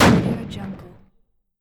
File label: light naval cannon blast